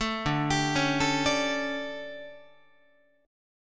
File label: D Major 7th Suspended 4th, Guitar; arpeggiated; 4A,4E,5A,5D,5G#,6C#; chord; 12,10,10,11,13,13